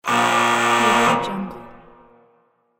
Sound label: buzzer